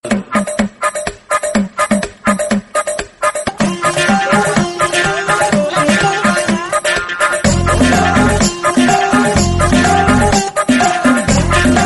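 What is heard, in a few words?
comedy background music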